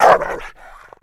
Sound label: Rottweiler Vicious